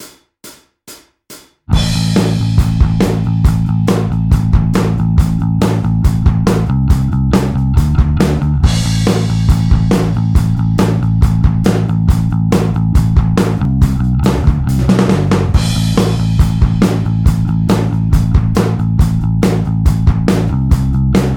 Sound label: b. track rock